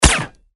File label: Silecent Pistol